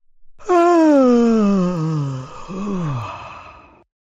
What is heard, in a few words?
yawn